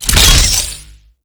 armor pickup

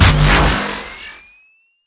plasma reload

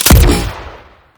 pistol fire large, weapons

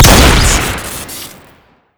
lever shoot crit

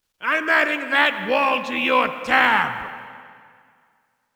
gray mann wallbreak

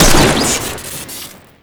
ak47 shoot crit

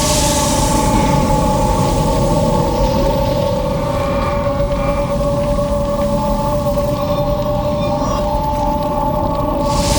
wolf energy loop; marauder

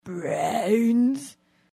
zombie groan; mvm forever

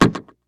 catapult launch

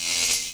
scanner electric quiet

